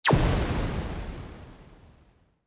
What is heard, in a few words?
Laser Fire; castelloe